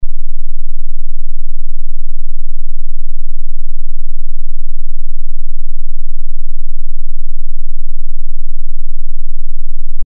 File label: 10Hz